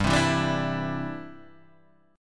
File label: D 7th Suspended 2nd Suspended 4th, G